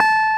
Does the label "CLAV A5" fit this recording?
Yes